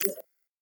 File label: Ui Menu research item hover